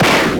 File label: Shoot